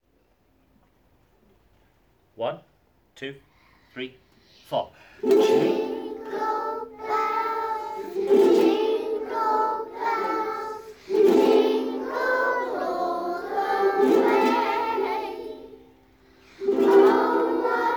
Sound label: village jingle